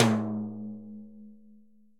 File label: Timbales B L Rim; Latin Percussion; Drum Hits; Ableton; Factory Packs